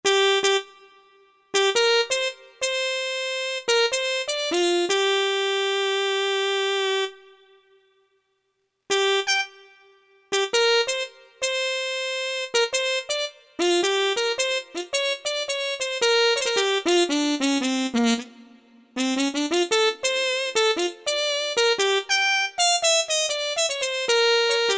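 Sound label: brass Muted Trumpet; E-mu Proteus FX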